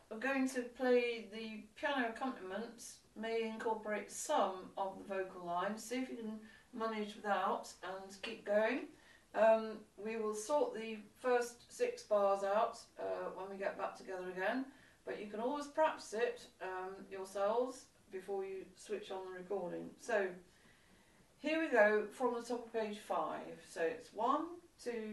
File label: piano accompaniment